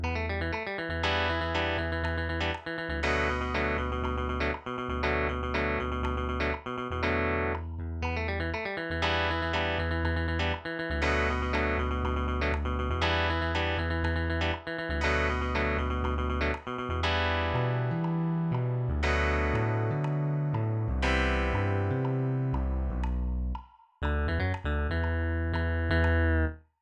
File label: Conga